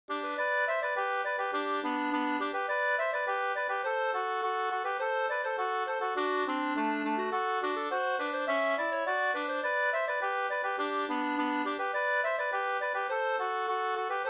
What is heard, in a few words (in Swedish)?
snoa